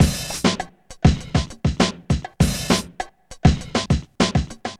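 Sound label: 100 bpm, Funky loops; Zero-G; Funky Elements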